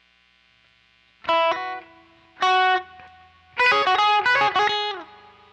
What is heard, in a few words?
quilter solo